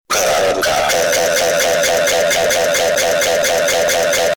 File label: brazilian kkkkkkkkkkkkkkk with long kkkkkkkkk